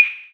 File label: rev clave